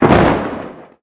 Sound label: lightbolt